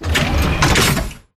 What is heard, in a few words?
med bay rack offload; Titanfall 2